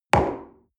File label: coffee place table medium short